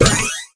goal; billards

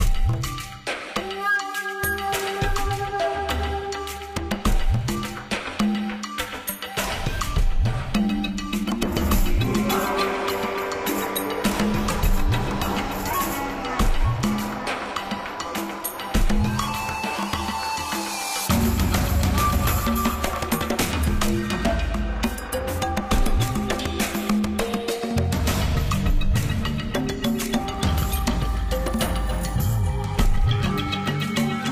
gamemusic; block super match

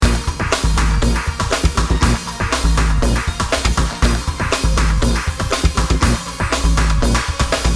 action loop; Barrel-Jump

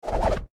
touch block; block super match

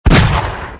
shot; cowboyrun

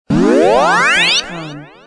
power up; Jungle-War

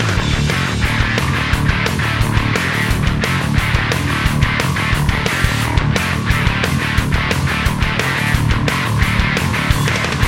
soundtrack; Downhill Ski

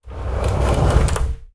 close door; Knights-Diamond

